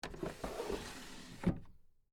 Drawer Close, Foley, Drawer, Close, Antique, Wood, Table, Small; Drawer Sound Effects; Blast Wave